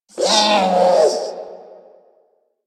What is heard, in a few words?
Repair Drone Idle Angry; Warframe; Necramech Repair Drone